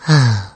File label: "Phew", quick; Luigi